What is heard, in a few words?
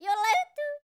YODEL, World Voices; ILIO; Vocal Planet